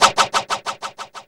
SCRAPE LOOP; Back In Time Records; Big Bang; SFX

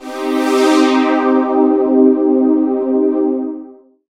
pad, Dance Synths

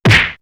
In the Face, perc fx; X-Static Goldmine

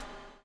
BUBL, Backbeat; Spectrasonics - Backbeat

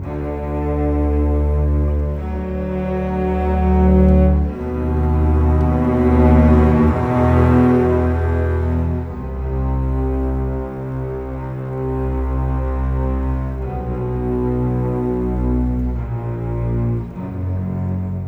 Bass Cello, Cinematic; Sonic Foundry (Sony Creative Software); Orchestral Series VOL-3 - Cinematic

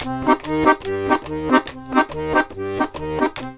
140, Complete Accordions

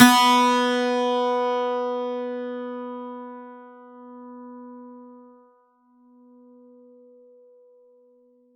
PLK 12STR B3, Acoustic and Electric, Plucked; Polyend; Simulacrum Sounds; 1 Shots

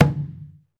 Skin Drums

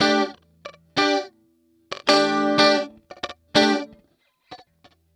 Electric Guitar

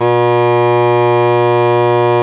BASS SHAWM; SoundBytes - Early Patches

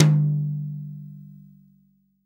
TOM, Real Drum Kit, SWING KIT; Best Service ProSamples vol.43 - Real Drum Kits

